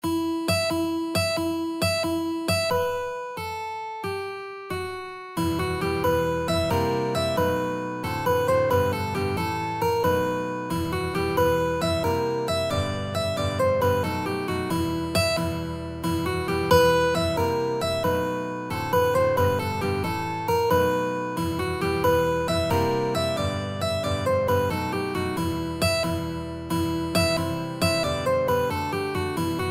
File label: twostep